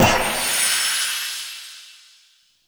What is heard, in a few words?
Spawn Machine Item Spawn; Echoes; Warframe; Entrati